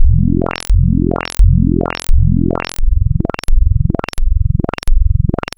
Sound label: Arp Pattern; Synthetic's Serum Collection; Echo Sound Works - Modular